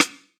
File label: dry steel snare drum c sharp key